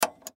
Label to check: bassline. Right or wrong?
Wrong